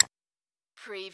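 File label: Servo Panel Sound - Switch small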